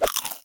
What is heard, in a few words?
get food; Original TK Sounds